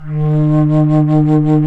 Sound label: FLT, Bass Flute, BsFlt vib; Roland L-CDX-03 Disk 1